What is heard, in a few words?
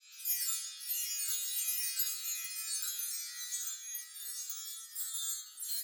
chimes; Scrat